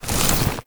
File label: leopard spout